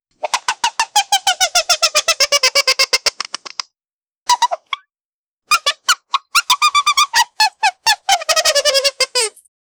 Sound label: slippery squeak as waterm, fruit